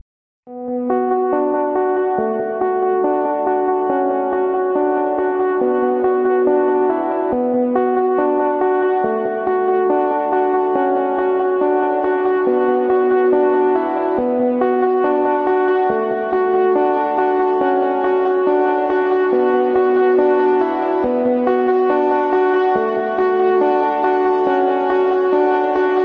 R and B

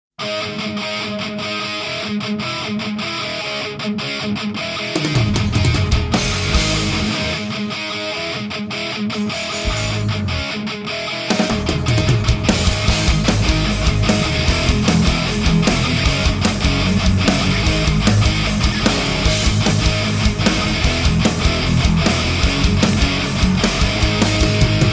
Hard Rock

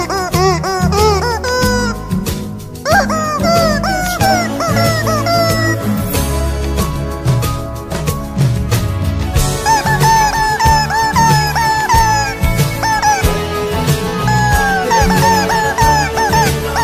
chicken

